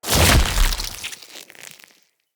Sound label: Root Spider Elite Web Shot Proj Burst; Animals; Spiders; Abilities